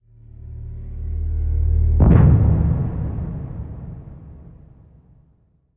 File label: music - mystery - new mystery; You Are Empty